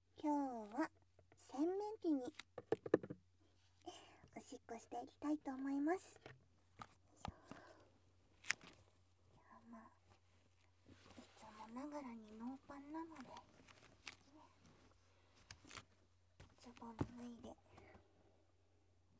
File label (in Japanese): 洗面器に！オシッコですッ！(レコーダー)